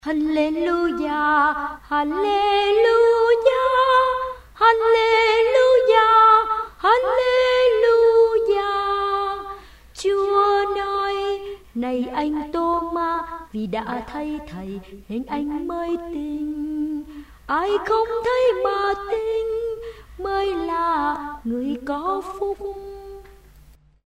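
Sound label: soprano tenor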